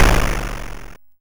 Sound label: Snare, YM, CHIPSHOP HITS DRUMS; ChipShop Deluxe